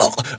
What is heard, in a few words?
choke; player; blade